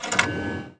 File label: mouse multi; Bombardier